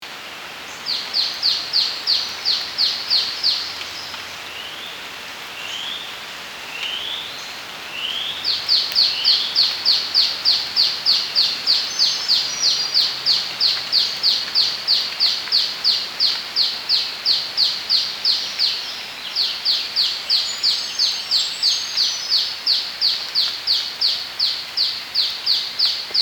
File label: Eopsaltria Capito Nana; Northern Pale-Yellow Robin